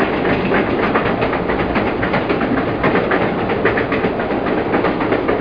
train

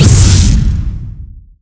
Shield Impact; Phoenix

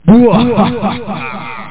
BUWAHAHA; Software Vault - The Gold Collection (American Databankers)

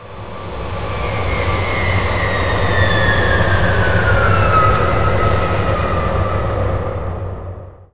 space ship dock; terren

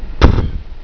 Yellow Mold Death; Mythos

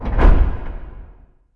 Clank

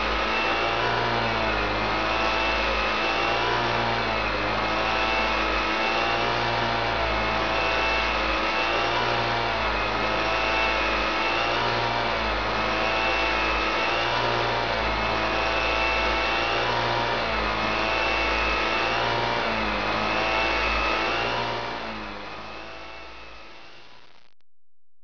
vaccuum

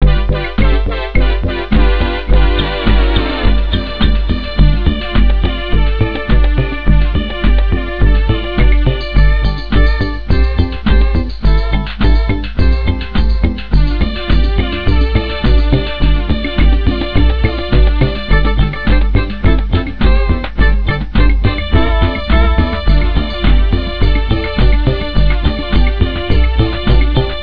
dsco funk; The Official Ms. Metaverse Judge Handbook

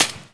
Ball Branch; Tiger Woods

